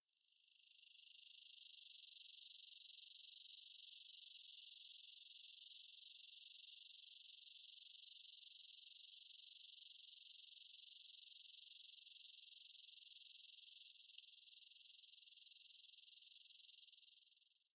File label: Diceroprocta swalei, cicadas songs; US; AZ; Diceroprocta swalei (Distant, 1904)